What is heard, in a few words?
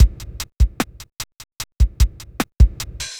hiphop